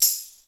DANCE.P TAMB; 90 sSampleCDs; Crypton - DrumBase5000

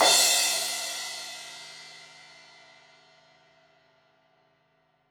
Crash 16in edge, Cymbals

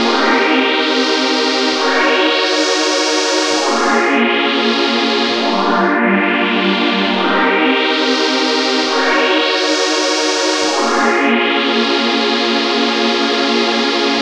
Pad 135 BPM C, Club Techno; Zero-G; Groove Loops

